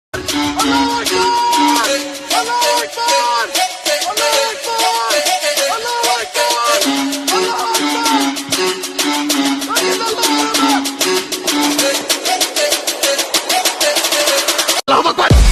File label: remix